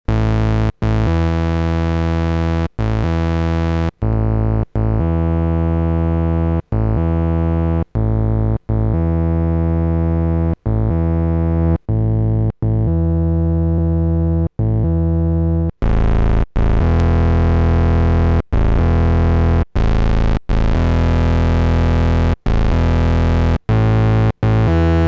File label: Drive; PWM Malevolent